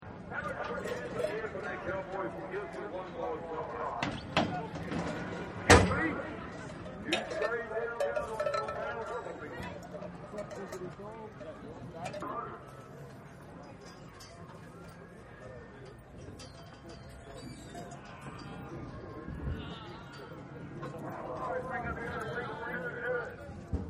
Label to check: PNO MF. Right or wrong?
Wrong